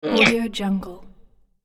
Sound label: cartoon kid makes effort